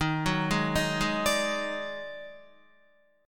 D sharp Minor Major 7th Double Flat 5th D sharp Guitar; arpeggiated